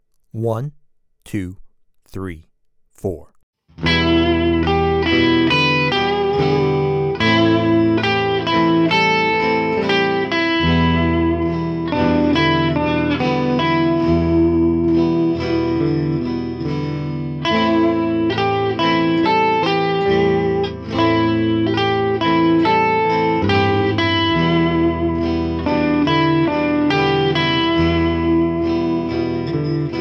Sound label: Blues in Em